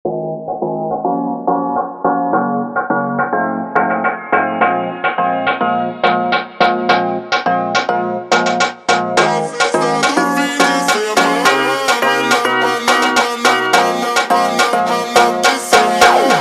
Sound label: Funk ultra Slowed